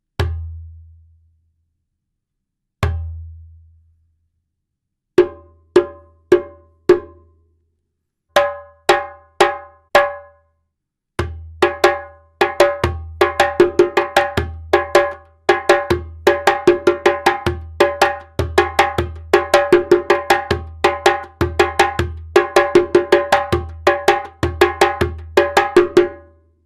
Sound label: djembe